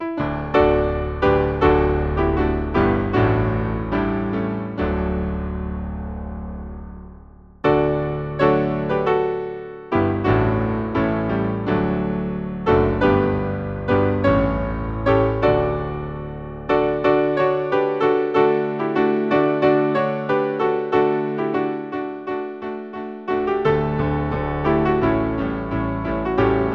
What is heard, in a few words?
Hymn, piano; Joy to the World; Antioch